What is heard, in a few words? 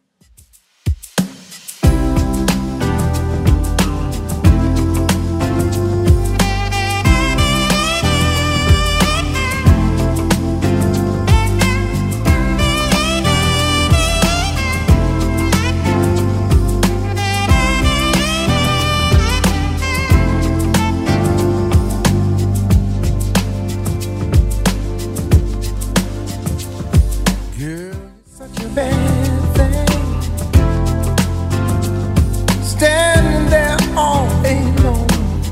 90s 92bpm; Redrum